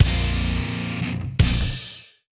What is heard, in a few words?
Reward music